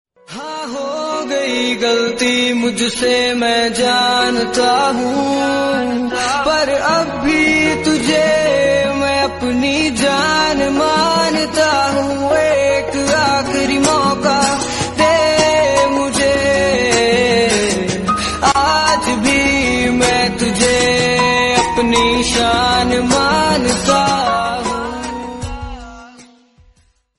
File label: hindi sad song